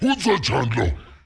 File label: orc ambient short